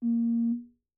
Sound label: contact mic, cellphone, broken, vibration, notification, long; Outward Inversion